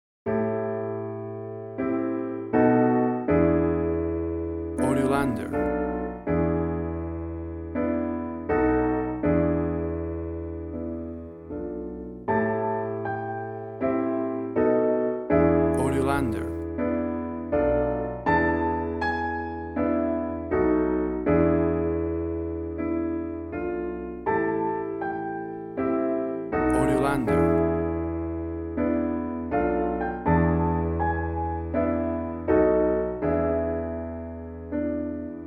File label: Jazz Music Underscore